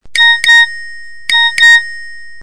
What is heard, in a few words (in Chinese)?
nokia 2 duan xin